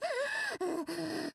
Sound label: gonome pain